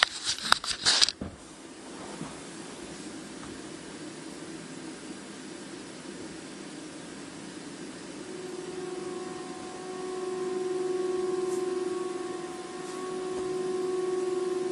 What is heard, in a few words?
Radio Waves